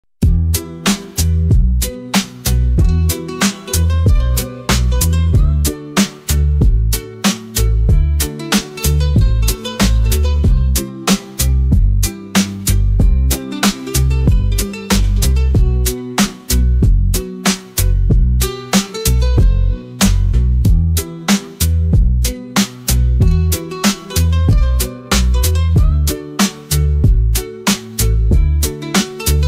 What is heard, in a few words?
spanish acoustic guitar type beat hip hop freestyle